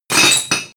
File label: glass clang together